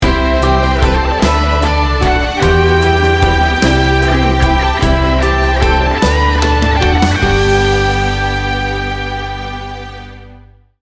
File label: mountain high sting bumper